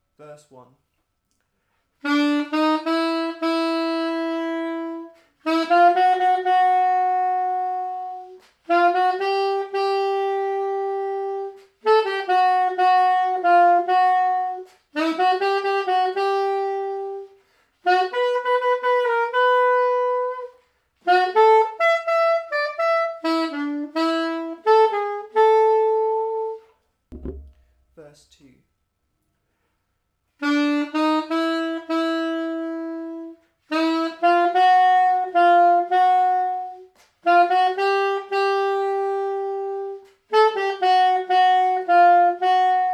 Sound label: Melody